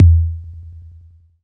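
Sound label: bd, 808 bass drum; Sound & Vision - Gigapack I; DANCE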